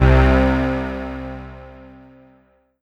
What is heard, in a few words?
M-Audio Venom Octavide C2